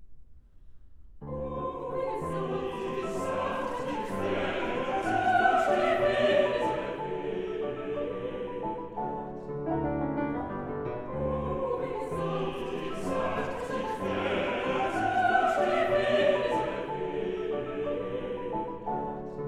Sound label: Waltzes for vocal quartet & piano, 4 hands; Liebeslieder Waltzes (18); O wie sanft die Quell; Brahms